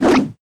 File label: giant jump